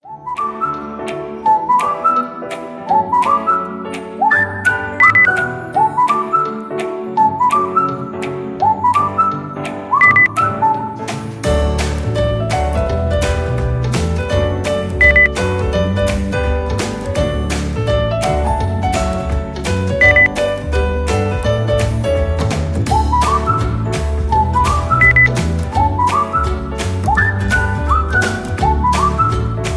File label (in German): gute laune einfach gut drauf beep